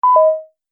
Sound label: Multimedia Event Notification, beep sound effect